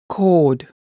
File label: chord; 'ko:d